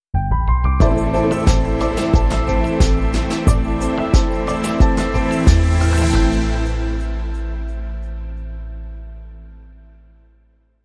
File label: Short Sting